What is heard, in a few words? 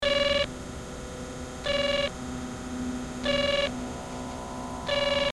hail beep clean, computer